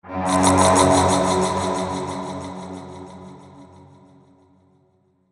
Stinger; Bunker; Amnesia: The Bunker

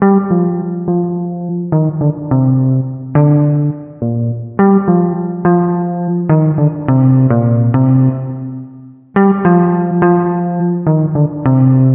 Guitar

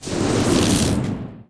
Darkwave

